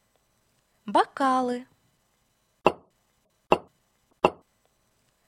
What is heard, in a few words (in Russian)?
Бокалы